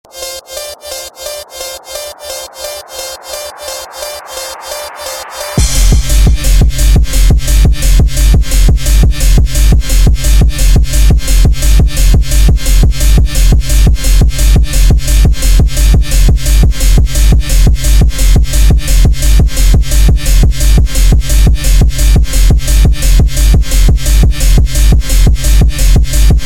House Phonk phonk Remix

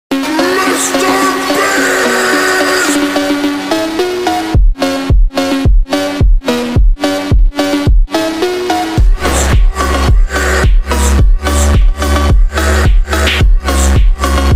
Phonk slowed

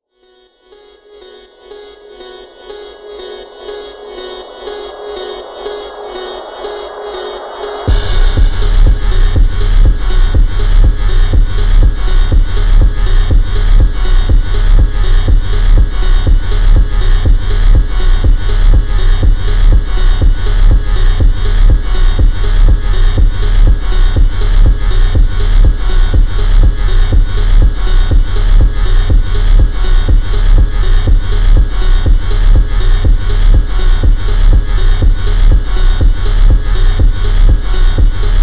House Phonk slowed Reverb Music Remix

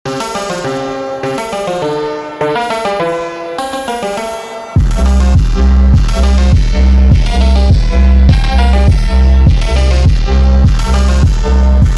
Christmas Phonk 8d Music Remix

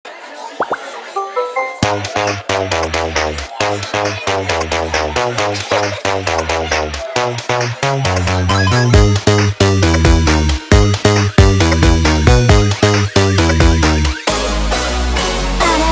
Funk Sped Up

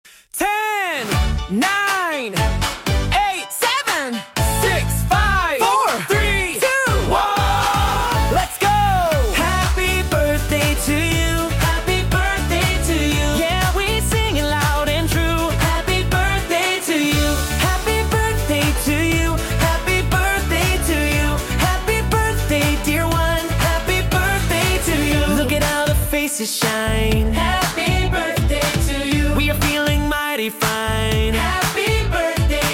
Birthday Party Countdown - Happy Birthday Song

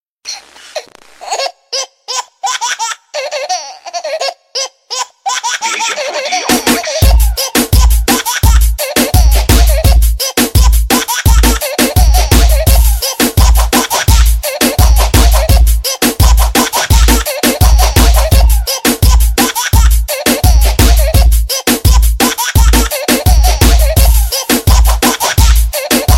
Baby Laugh Brega Funk

